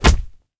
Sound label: beat light punch